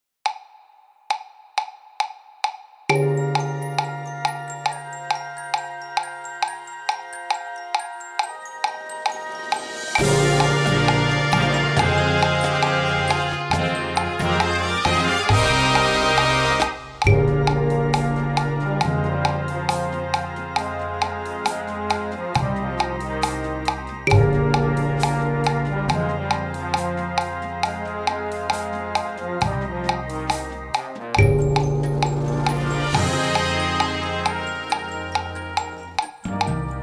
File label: click track